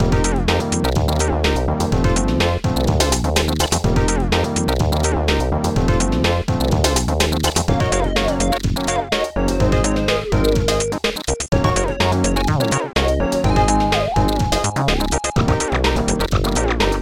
tune; Trolls; Protracker Module

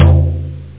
tom; toms

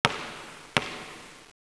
dribble, sports; KABOOM! - Wild and Wacky Sound Software

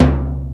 TYMPAN, PERCUS

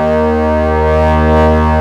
btn; Designer Bodies

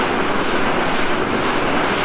SKID MUDD; V-Rally

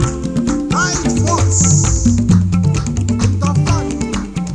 RHYTH, LOOPS

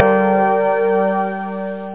Teleport; Zoom (1995); Active Software, Ground Zero

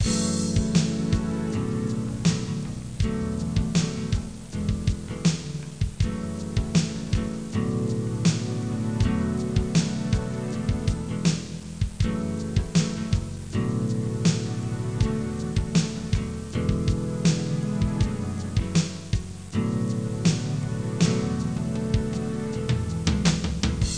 Music; Bible Fun Pack; Education Publishing Concepts; NOAH

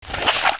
Bow Stow; Player; Heretic II Demo

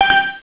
bonus got; sdlroids

